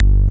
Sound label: bass